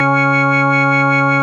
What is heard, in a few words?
ORG VOX C#; Roland LCDP09 Keys of the 60s and 70s 1